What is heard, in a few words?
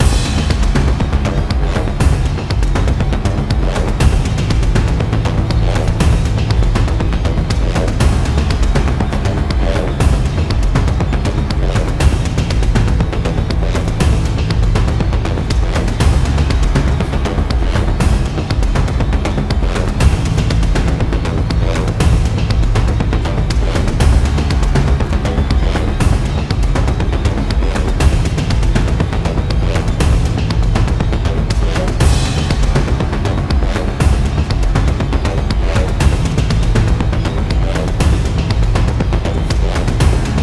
BGM Kowloon Park Boss